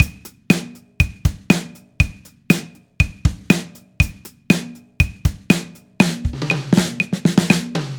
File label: timefeel normal